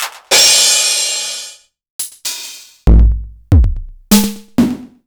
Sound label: Korg Electribe ER-1 Kit